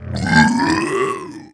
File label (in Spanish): boomer vomito; zombie plague